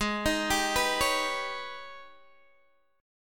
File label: G sharp Minor Major 11th, Guitar Open D